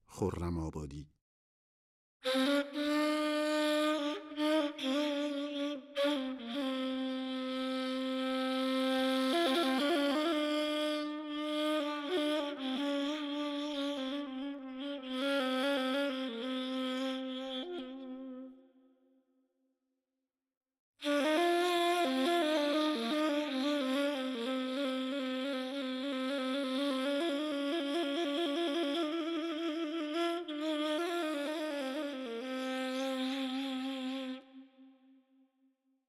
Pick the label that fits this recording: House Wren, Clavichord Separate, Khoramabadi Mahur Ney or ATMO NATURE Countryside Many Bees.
Khoramabadi Mahur Ney